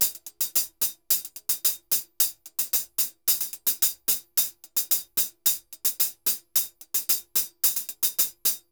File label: HH Murga 110 bpm; Best Service; Festa Latina VOL-1